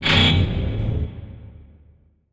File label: Transition Metallic Hit